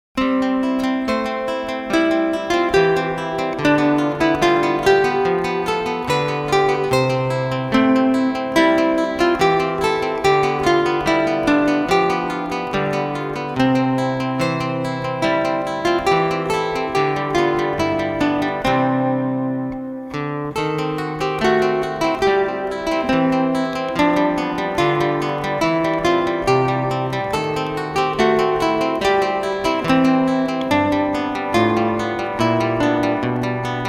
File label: praeludium, 2 Gitarren; klassisch